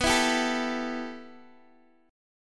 B 7th Suspended 4th Sharp 5th B down Guitar Open G